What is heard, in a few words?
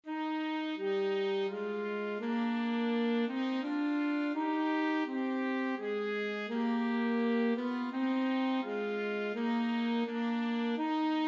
trumpet saxophone duet; god save the queen